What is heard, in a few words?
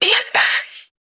jingle